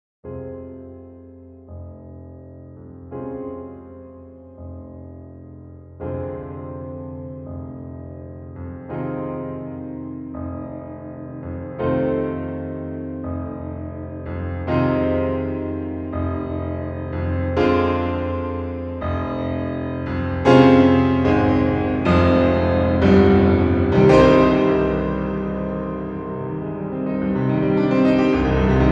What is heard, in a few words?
Piano Concerto 2; Rachmaninov; Teldex